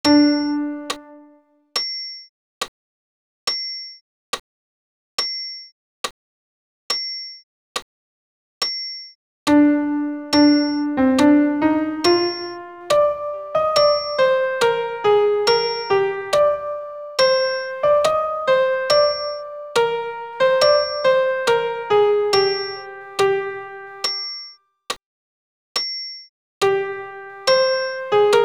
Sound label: Christe alt pian seul